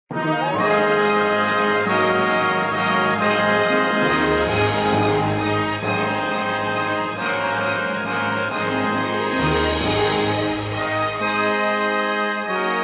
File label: men's chorus